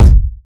round kick drum one shot f key, Royality free kick drum sound tuned to the F note; kick drum samples